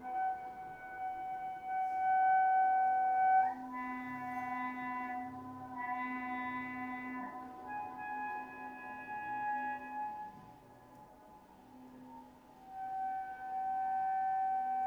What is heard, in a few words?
Clarinet